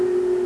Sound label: health